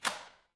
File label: door lock